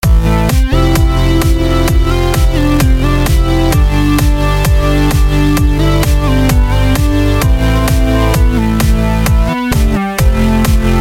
Piano Synth

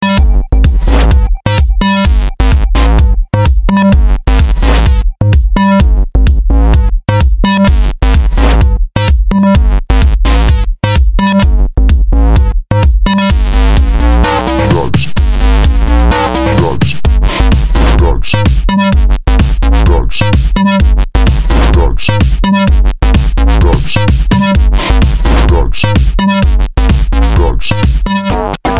Elektrik Club Mix

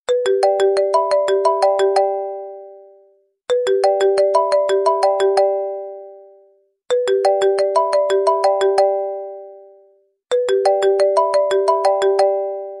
Iphone Ringtone

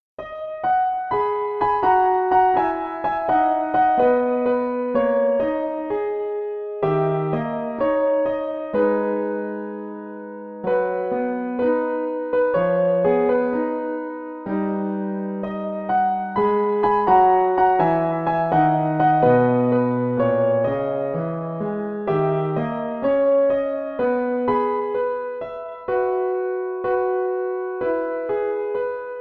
Piano; Kimi Ni Todoke

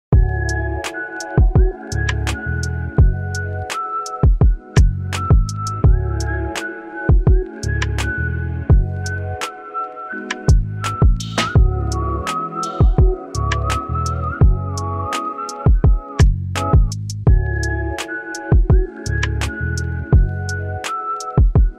Lofi

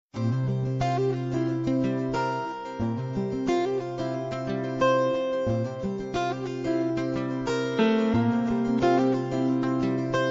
South Classical

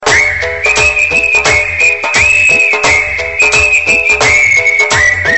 Whistle Sms

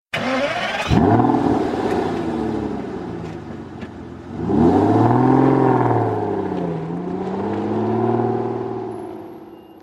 Audi R8 Tone

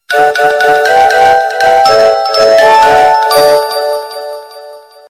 Alarm Sms

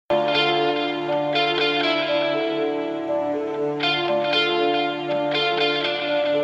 metal

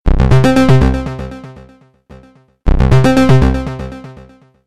Robot Wave Sms

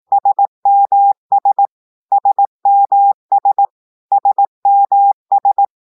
SMS Morse Code

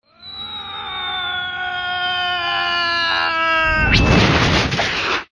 Falling To Ground

